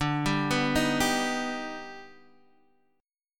Eb Augmented Major 7th D

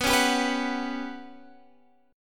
G Major 7th Flat 5th

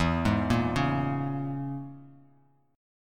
E 6th Flat 5th Bass Standard, E6b5 chord

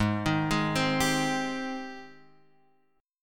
G sharp Major 7th G sharp

